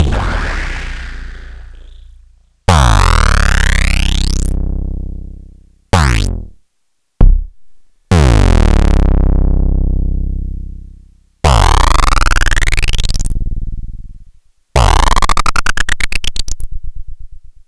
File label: Kick Distorted Feedback